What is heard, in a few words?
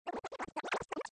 robo